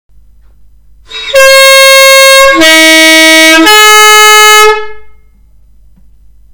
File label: tuetato